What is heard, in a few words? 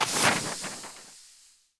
spin button; netent